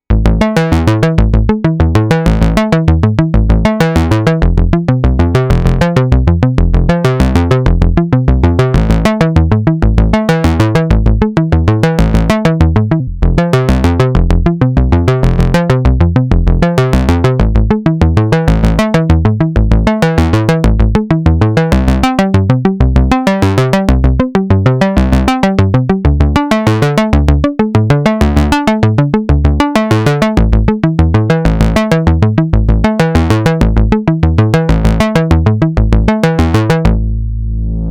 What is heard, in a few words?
MOOG MAVIS FM BASS Dry Signal